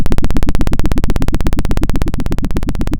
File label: Sound of a helicopter